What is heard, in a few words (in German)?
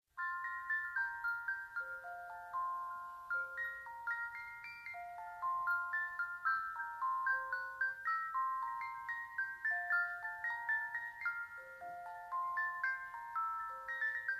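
Mozart Wiegenlied, Spieluhr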